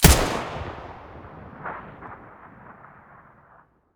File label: hk416 muzzle mono